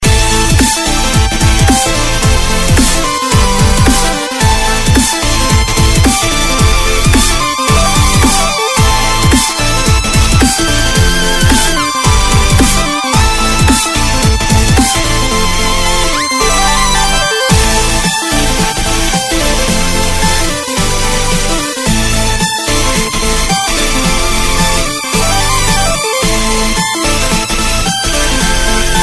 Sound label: double jump